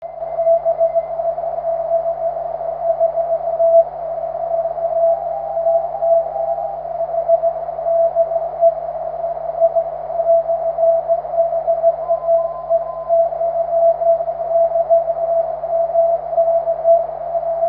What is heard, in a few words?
cw; 23cm